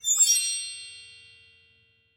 Eerie High Ring; SoundsCrate

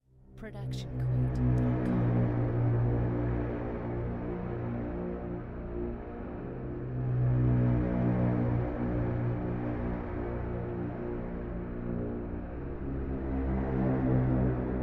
dark slow bends

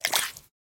splat

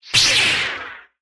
lightsword turning on; soundscrate

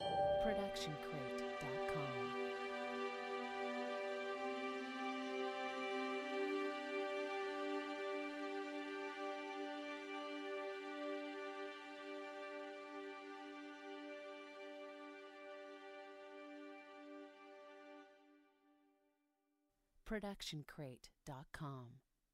optimistic pluck plus long strings; soundscrate

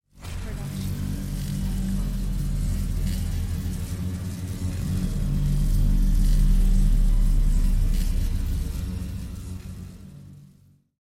dark magical energy

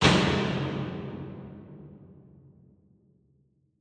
designer medium boom hit

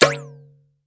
boink; SoundsCrate

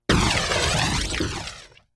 fire blast

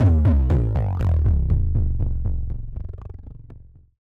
Bass Drop; SoundsCrate

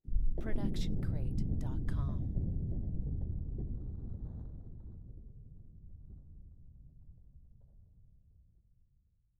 Deep and Far Explosion; SoundsCrate

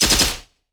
Assault Rifle; SoundsCrate

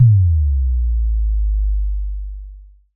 electronic kick bass drop long

